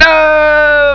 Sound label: nooo; Gold Digger 1.2.1; Arcade